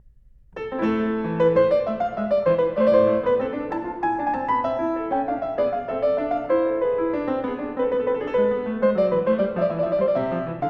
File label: A Minor, Courante; English Suite #2; BWV 807